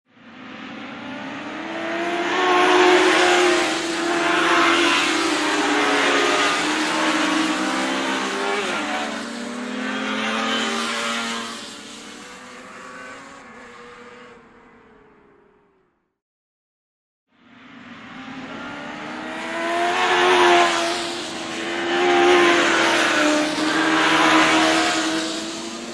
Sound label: Ring race motorcycles are passing by; motorcycle racing